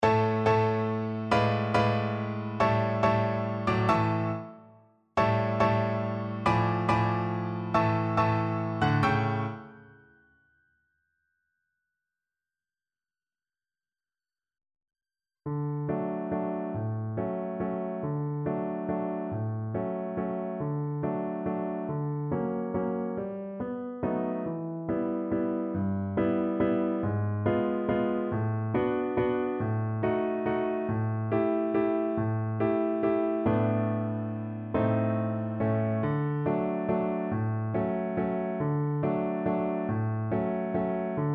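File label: waltz violin karaoke; black swan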